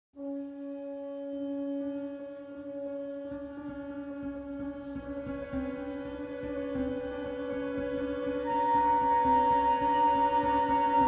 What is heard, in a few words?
Music Ambient; nosferatu the wrath of malachi